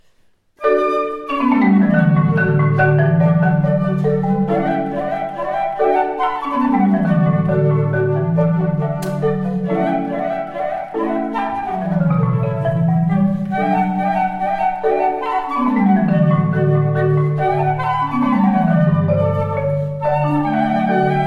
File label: marimba flutes Allegro; Vivaldi Chardonneret